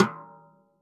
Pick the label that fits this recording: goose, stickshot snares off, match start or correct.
stickshot snares off